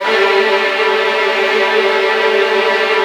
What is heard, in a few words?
Virtuoso Strings; ILIO; Kirk Hunter Virtuoso Strings VOL-3; 90 s Sample CDs